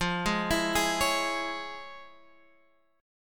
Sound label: F Minor Major 7th Sharp 5th Guitar Open G; 15,13,14,13,14,x